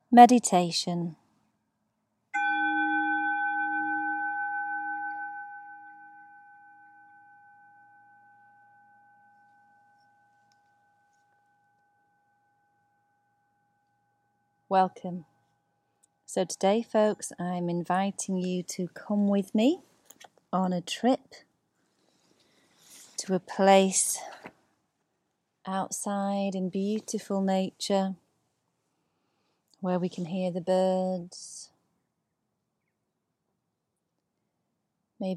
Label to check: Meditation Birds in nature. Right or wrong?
Right